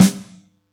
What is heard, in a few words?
Yamaha EX-5